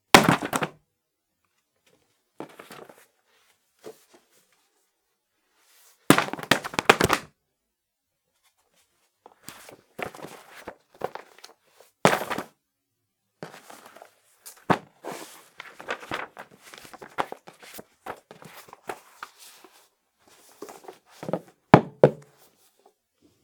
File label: book; 薄めの本（台本）をまとめて落とす音